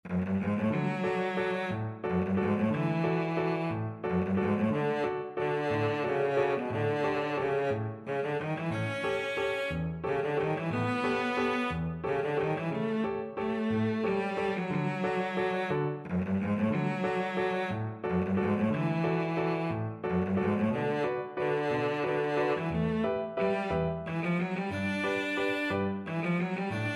wedding dance, cello

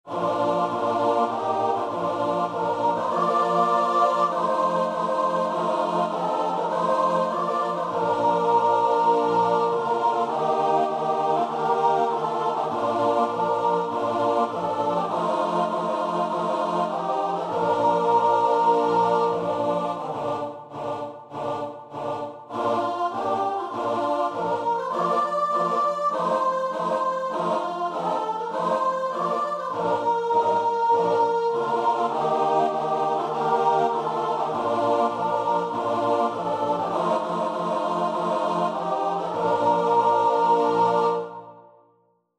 brown church, choir

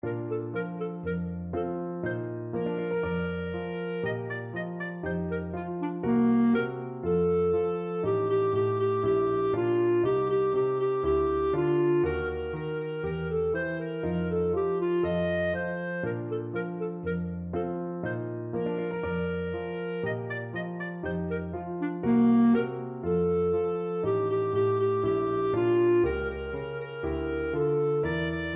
reed pipes, clarinet

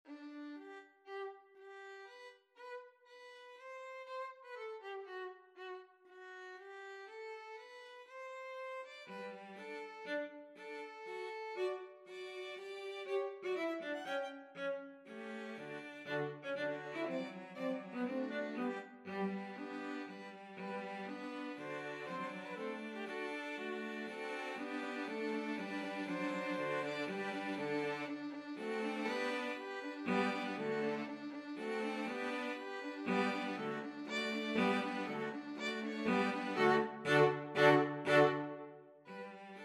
2 violins 2 cellos

